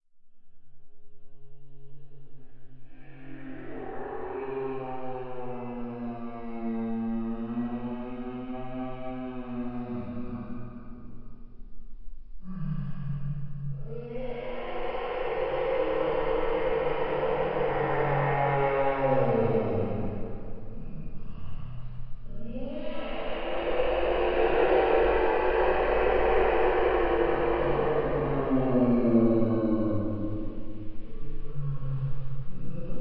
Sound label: Monster In Pain